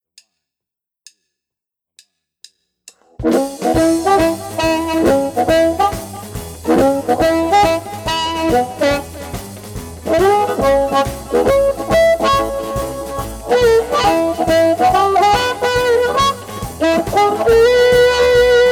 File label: harp train; amp reviews